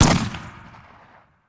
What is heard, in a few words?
Bullet Impact; Sound Ideas; Guns